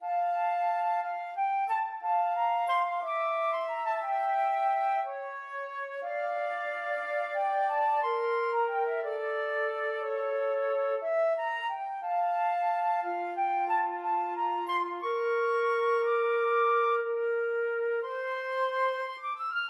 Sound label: duos adagio flute duet